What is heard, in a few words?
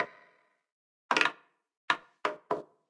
ball bounce, roulette